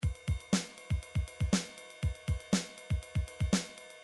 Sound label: drums, midi drums